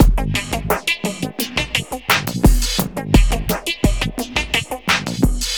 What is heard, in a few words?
Elektro, Processed Percussion